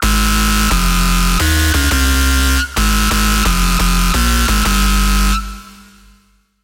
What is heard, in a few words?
Lead, Sylenth1; Routed Sound; Sylenth1 Expansion - Chaos Bringer Vol.1